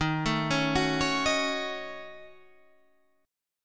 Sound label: D sharp Minor 7th Flat 5th D sharp down Guitar Half Step Down